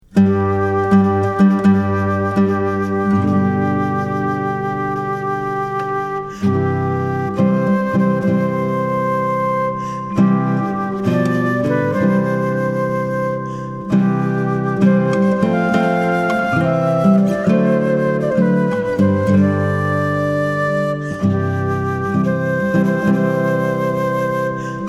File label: Wedding Chorus Flute